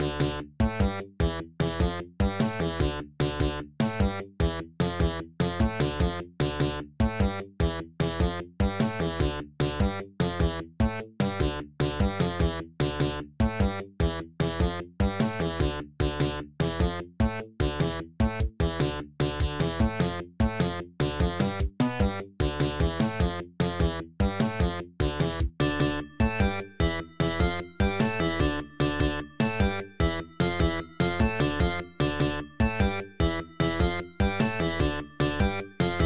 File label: sega-style fm synth remix; Fakebit / Chiptune Music; castle nosferatu; Fakebit / Chiptune Music Pack